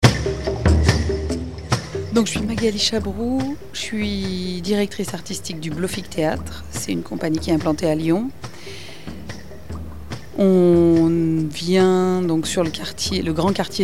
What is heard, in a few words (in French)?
Generique fin